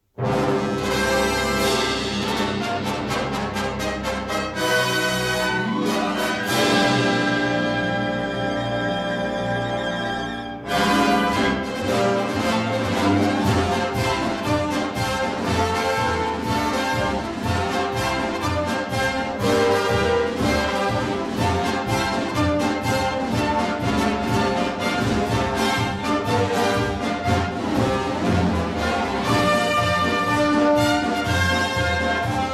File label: battle cry